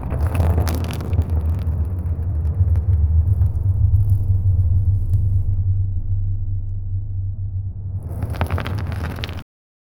massive stone slab slidin; dungeon

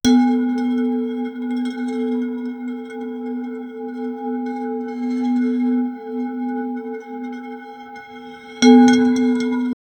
produce a rustic handcraf, crafting

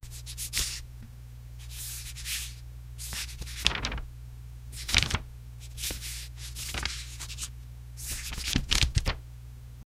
single paper card sliding, table

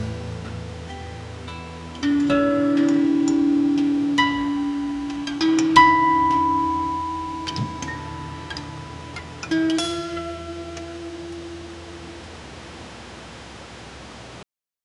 hawaiian music ukulele

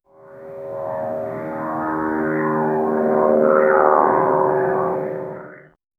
a sound of a small, airplane

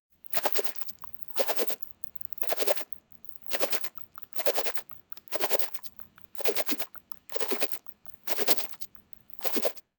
hippopotamus shaking his, submerge

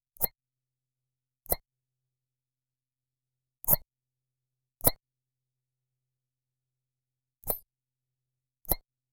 ui sounds brush; menu

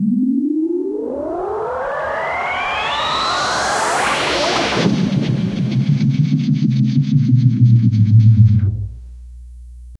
cinematic spaceship start

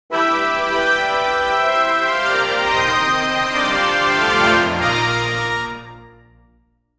cheerful short orchestral, 90s

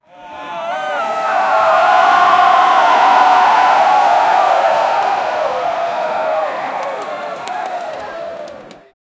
a crowd of people running; anxiety

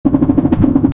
the sound of the tracks, regular

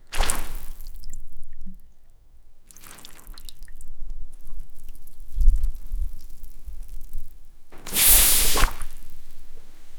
wet cotton fabric being g, textile

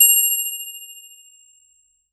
short organic coin collec; collect

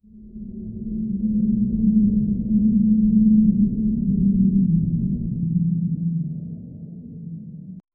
ominous mysterious dark

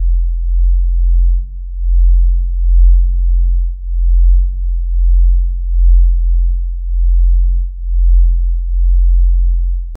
low deep cinematic bass p; musical

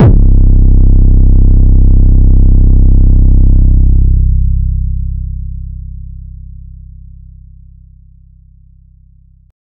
very cool and perfect har, bass

